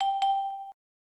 message, interface sounds; p0ss

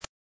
cut, interface sounds; p0ss

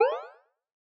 use item; leohpaz; retro rpg menu sounds

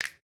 snap, interface sounds; p0ss

